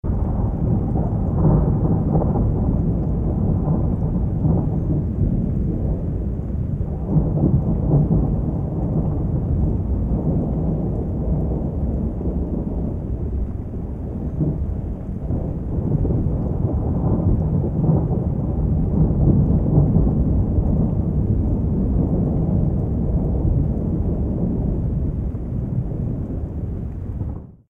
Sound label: rumbling weather atmo